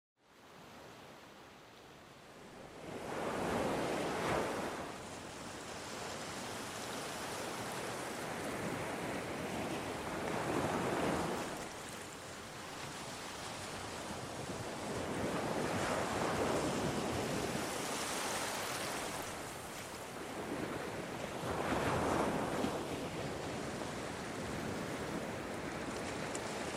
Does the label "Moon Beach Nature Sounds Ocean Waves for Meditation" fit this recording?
Yes